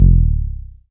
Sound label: Tom MKS, Drums